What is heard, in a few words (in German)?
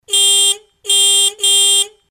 Hupe